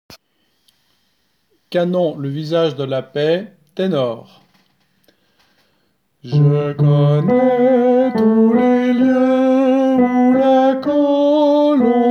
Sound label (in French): canon ténor